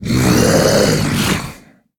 Male Raptor Death

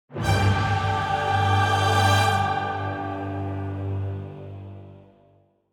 Play Stinger; Maiev

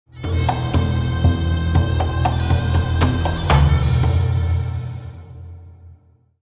Play Stinger; Halazzi

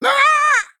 Male Parrot Death

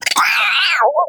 Vibrant Squirrel Death

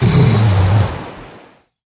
Attack; Water Elemental

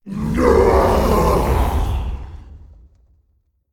Death

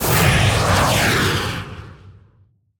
Attack; BlastmasterBoom

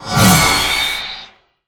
Dancing Swords Attack

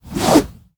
1H Staff Underlay Attack

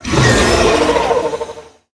Mistrunner Death

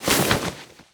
Stuffed Sack

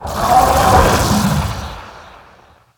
Mana Cyclone Attack

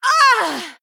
Female Human Death

